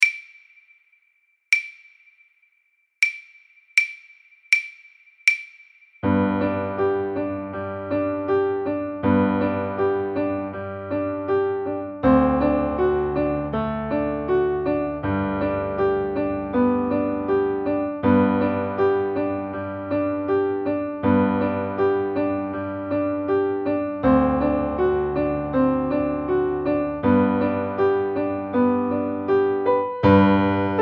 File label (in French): Noire 80 sans metro; suzuki V1